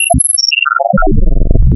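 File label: AM Complex Sine, wavetables, Amplitude Modulation; Architecture 32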